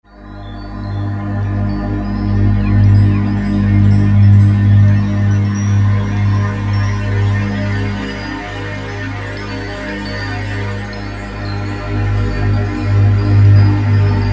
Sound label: Mystic Pad; Roland SR-JV80-11 Techno Collection